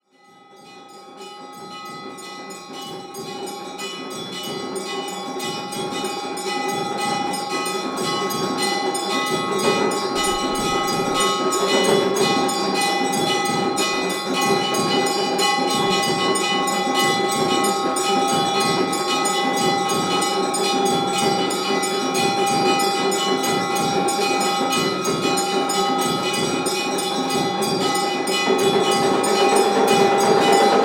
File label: Shiva Worship Ceremony Varanasi; Samuel Corwin; Selected Field Recordings from India and Nepal Volume II Religious Music